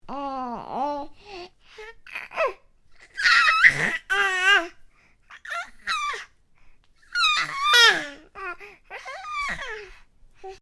Baby: making vocal noises, happy sounding; Baby Sound Effects; Babies (Sound Effects:Voice); Stuart Duffield

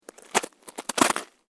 SNOW SCRAPE

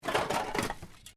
Can Softdrink Rubbish Move; various metal cans; Materials

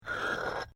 PAVING STONE MOVE, dragging

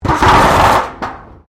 METAL BIN SCRAPE CONCRETE; Metal (Sound Effects:Materials)

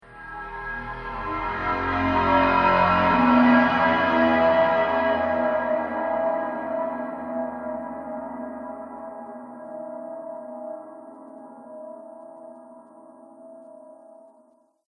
Horror Thriller Transition

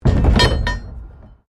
PLASTIC BARRICADE MOVE

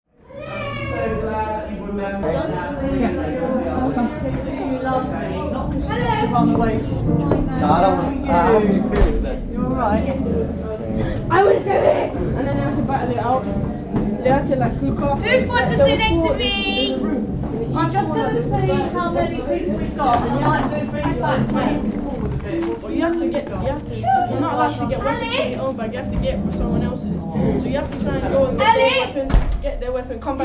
School Children Chatter at end of lesson; Secondary kids in class general chatter with music room practice b; Tom Hutchings

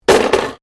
Cutlery general drop drawer, Household cutlery; Kitchen

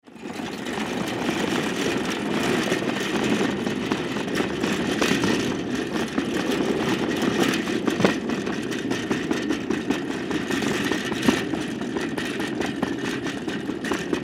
Go Kart Track, Go Kart Track Gas Cart Roll Cement; Blastwave

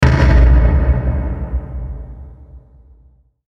Hit Linger, Hit Lingering Impact; Blastwave

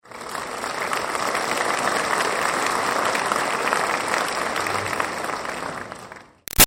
Crowd Applause Audience Grad Med; Auditorium; Graduation; Human; BigRoomSound

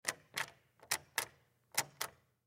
Hallway Door Locked Wiggle Handle; Office; Miscellaneous Doors; Blastwave

